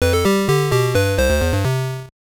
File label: Next Phase Jingle; Mario Bros.; NES